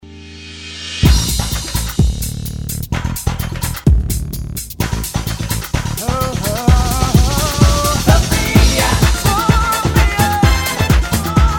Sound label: Cha Cha Cha